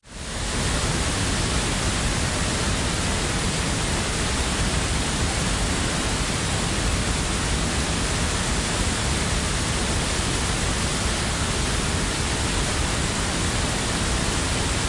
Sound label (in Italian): Geofonia; ecos paesaggi sonori negli ecomusei